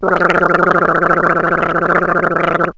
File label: big argle; CD Mania - The Perfect Multimedia Fun Pak!